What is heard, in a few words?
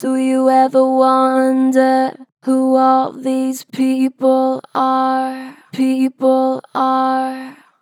Str Flat Do you ever wonder, Vocals, Pitch Flat Strong